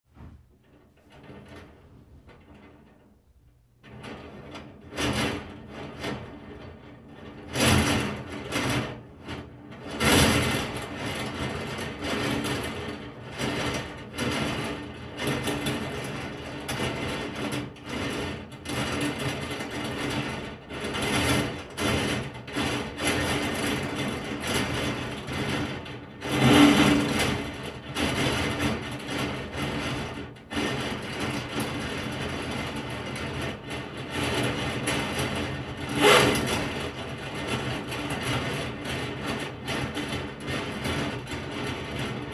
Rooter; Goes Down Through Pipe In Wall; Goes Down Through Pipe In Wall Fast, Close Perspective

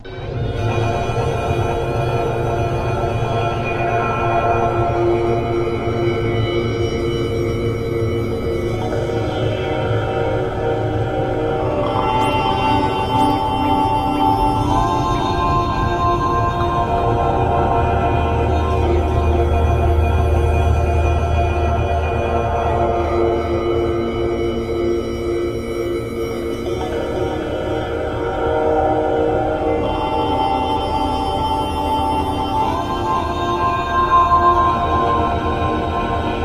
Nightmare Bell Tones

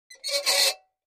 Metal Scrape On Metal

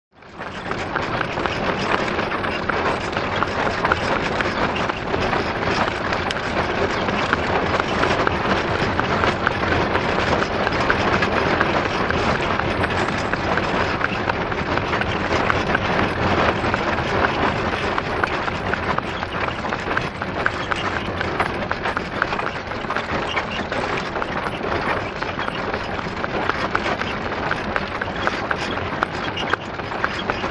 Away Pony Cart Start A, carriages

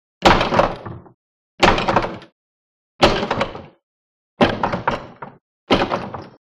Door Being Rammed, crash slams

